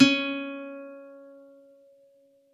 C#4, Campfire Nylon guitar; Worra's Campfire Nylon

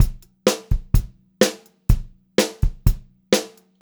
126 PO5, Pop-Rock Drumloops

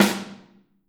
Snare OH; Snare OH All; Drums Percussion

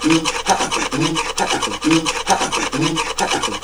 PYGMY LOOP; Zero G - Ethnic

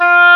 OBOE F3; AKAI S-Series CD-ROM Sound Library VOL-8

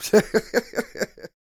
LAUGH; Spectrasonics Vocal Planet; Jazz FX

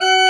CHRCH, CHURCH ORGAN; Roland - LA Composer vol. 1

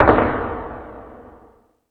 Tool Kit, Drum Machines

